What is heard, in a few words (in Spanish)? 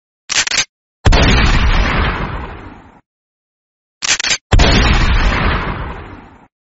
Escopeta